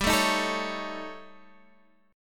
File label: A 7th Flat 5th G